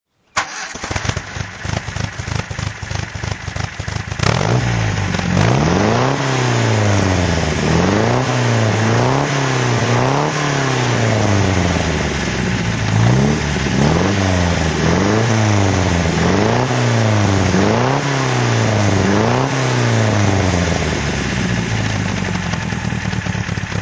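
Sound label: mga 1500 roadster throttle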